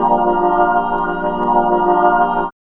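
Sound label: organ CH; E-Lab; X Static Goldmine 1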